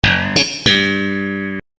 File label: sitcom transition bass riff; seinfeld